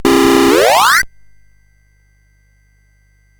Dragonworld sid CSG8580R5

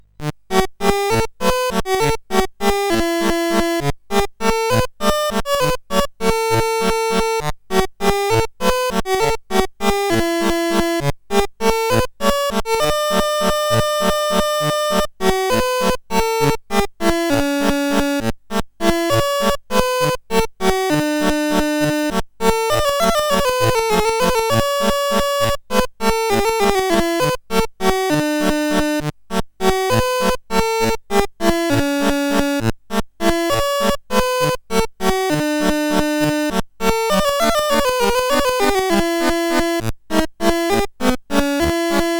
Folk Song, CSG8580R5; Master Composer

